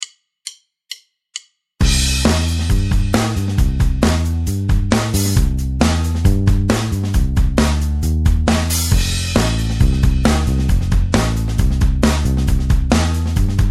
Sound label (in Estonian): Rocknroll trumm bass